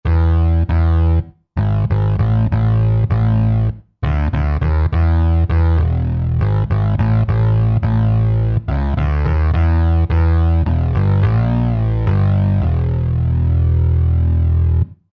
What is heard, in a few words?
Bass & Drums; Roland SR-JV80-10 Bass & Drums